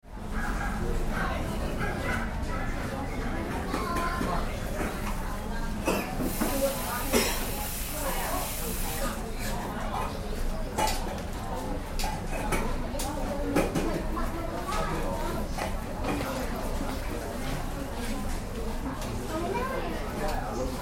Ambience from an outdoor cafe

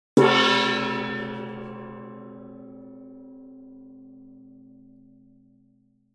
Medium sized gong hit

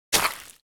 Footsteps single walking footstep on dirt

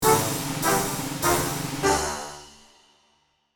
Fanfare you lose fail game fanfares; misc musical

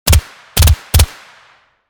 Tactical submachine gun two three round bursts; guns

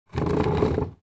Dragging a chair on the floor scrapes and creaks

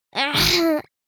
Little girl pain grunt

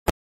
Ketchup bottle squeeze press squirt; cooking

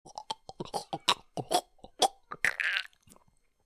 Man retching

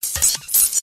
Modulated digital glitch

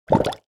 Cartoon bubble

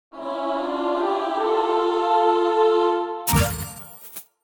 Mobile game holy reward

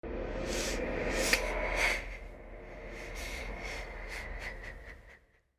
Female crying ghostly

